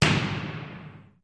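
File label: Impacts Metallic Echo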